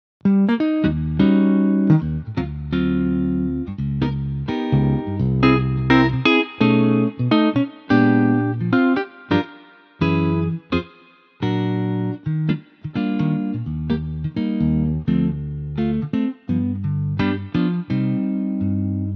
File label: Eb Elec Gtr, male